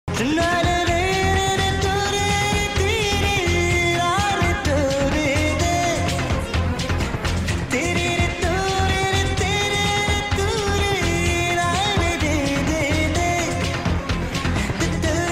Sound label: bgm feeling love songs tamil; Tajmahal